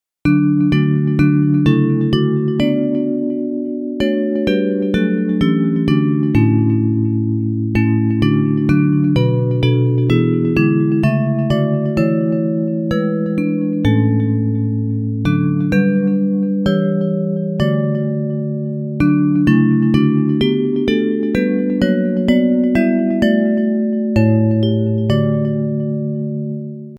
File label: Bells; Hymns